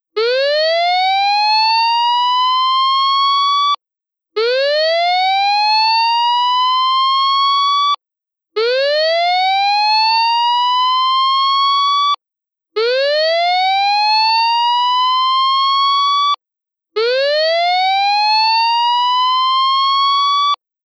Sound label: SIREN TEST